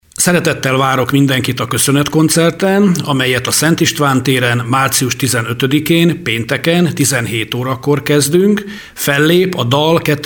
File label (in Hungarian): acoustic